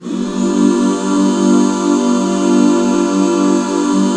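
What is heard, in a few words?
HAL G 7 left, Back Chords; Sound & Vision - Gigapack II CD 1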